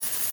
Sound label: Multimedia Internet CD-Rom Flash Wipe Short